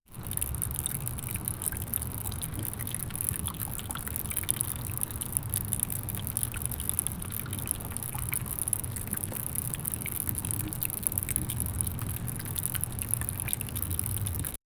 naturaleza relajacion abe, bee

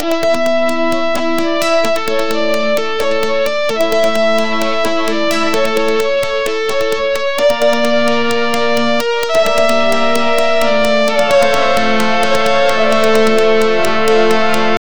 musica basada en la pieza, classical

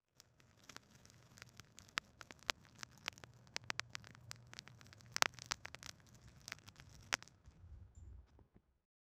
sonido de calabaza rompin, halloween

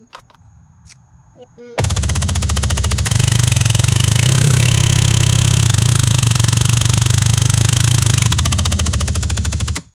cambio de marcha en moto, motorcycle

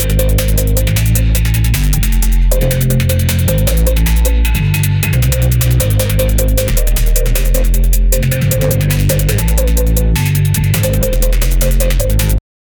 linea de bajos de dnb, drum bass

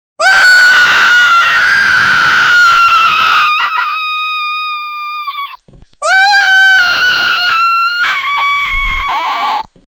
gritos de criaturas

